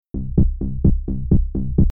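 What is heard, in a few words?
140 explosion bass dry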